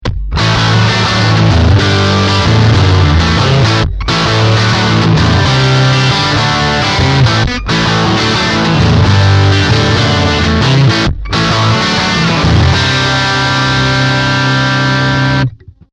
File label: Low Crunch